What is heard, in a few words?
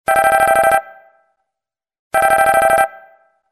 Minimal tone; Samsung GT-E2252